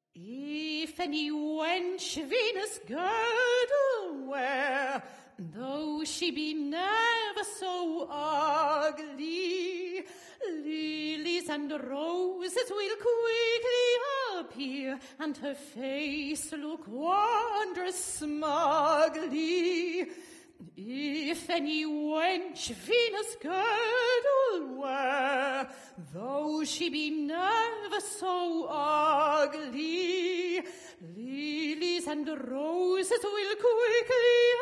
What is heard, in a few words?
Opera Ambience; Assassin's Creed III